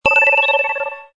Electro Tone; Samsung SGH-i710

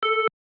dial tone; OnePlus One China

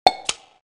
Camera

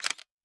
shutter mirror up

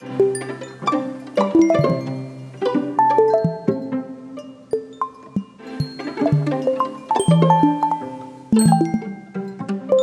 Rain; Google; Google Sounds; Natural Elements; alarms

